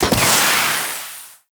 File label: Flying Spaceman Jetpack Burst; Warframe; Venus